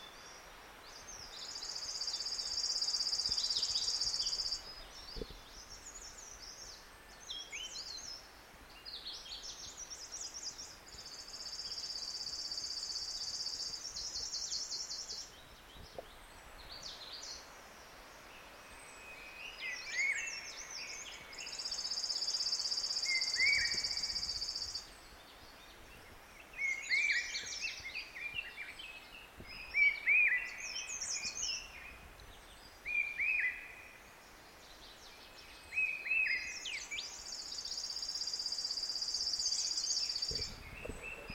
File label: Emei Leaf Warbler Phylloscopus emeiensis